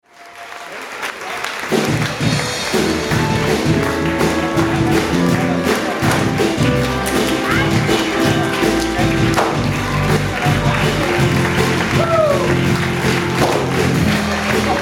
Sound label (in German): Applausmusik